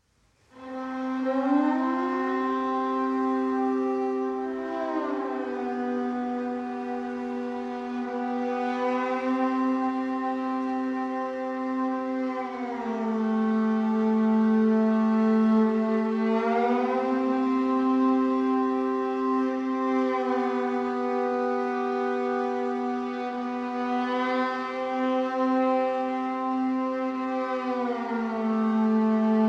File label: String Quartet Version